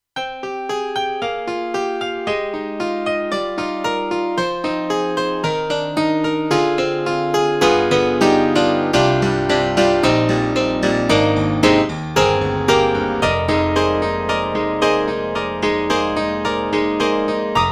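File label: hammer piano, KORG AG-10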